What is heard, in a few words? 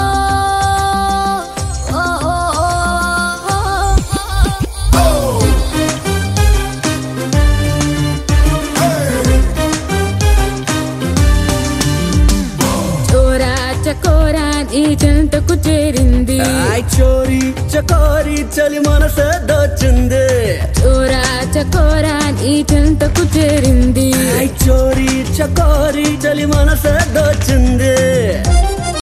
ringtone